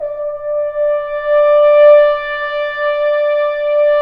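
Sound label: BRS F.HRNS, French Horns Swells; Roland L-CDX-03 Disk 2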